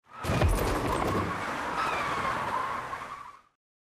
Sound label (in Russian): Sbili cheloveka